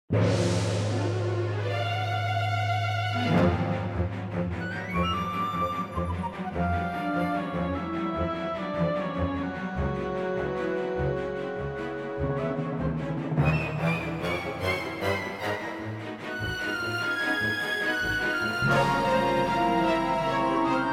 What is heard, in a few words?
drama plus woods